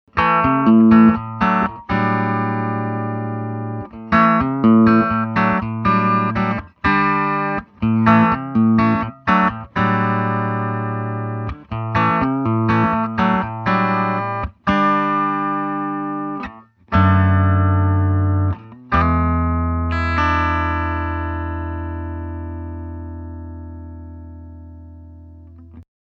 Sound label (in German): clean paula steg; vox mv50 clean test